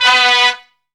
BLAST STAB; Zero-G; Funky Elements

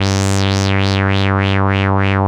WAH WAH G2; CMP - Analogon

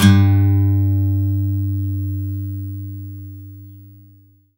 HRD PICK; Quantum Leap; Guitar & Bass

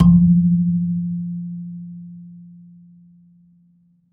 AFRBASMP, BASSE AFR; Univers Sons - Raricussions

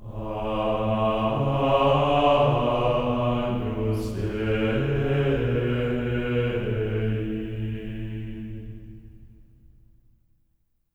AGNUS A3, Symphony of Voices; Spectrasonics; GR AGNUS

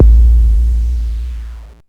BD, Mutable Instruments Anushri

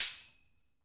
TAMB HIT, TAMBOURINE

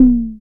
FX Analog; Sound & Vision - Gigapack II

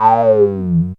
syn a#, Dance Synths, Wasps; Best Service ProSamples vol.37 - Dance Synths

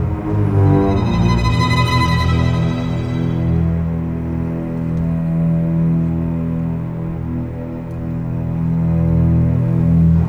Cinematic Strings, Orchestral

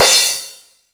Bass Crash, Jomox Airbase99, Drum Machines

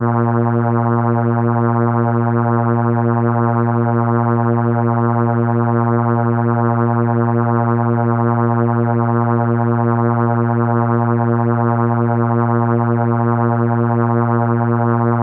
Horn A#3, ARP Solina

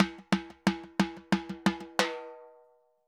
Timba Poly; Best Service; Festa Latina VOL-1; Drums & Percussion

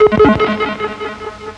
FX, Drum & Bass

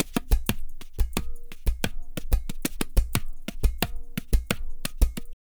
tabla, 90 bpm; Zero-G

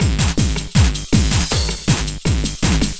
LOOP, Eurotech; Sounds Good

